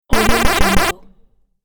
8bit alert report

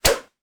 whip whoosh

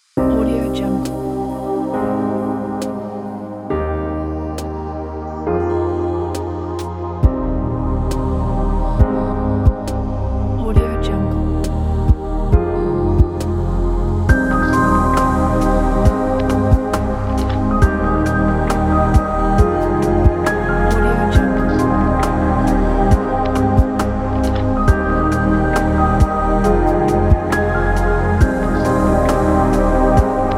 chill lofi

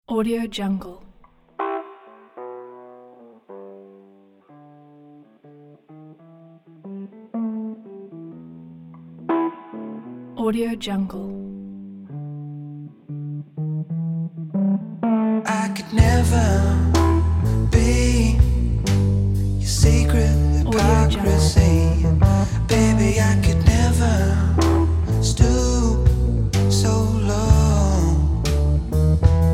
sexy downbeat blues with rock ending; samueljohnbrooks

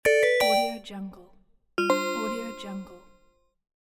game notification

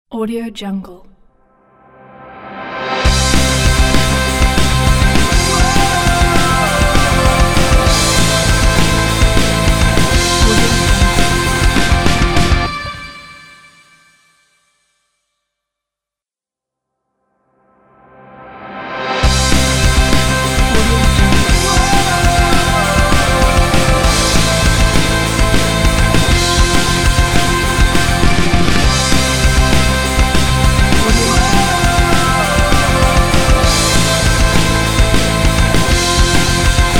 punk rock opening intro

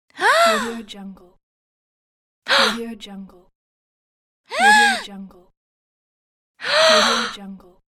female surprise gasp

